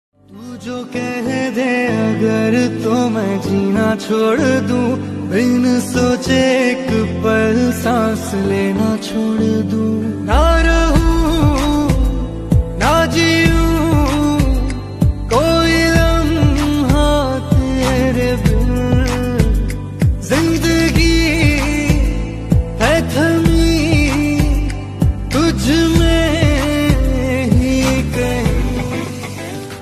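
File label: Latest Hindi Love Ringtone; ringtones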